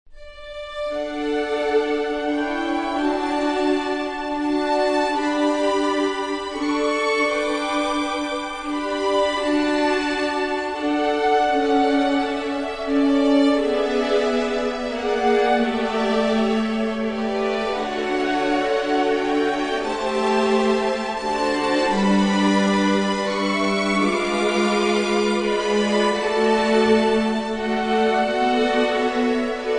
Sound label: Orchestra